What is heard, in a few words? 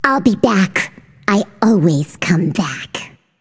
death; smite; voicepack; izanami